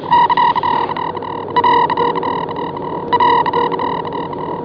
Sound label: airlock